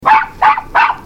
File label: bark